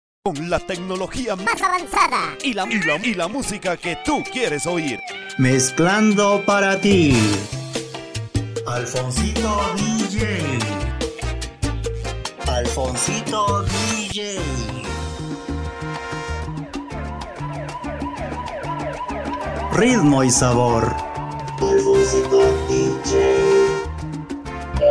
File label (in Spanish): salsa mix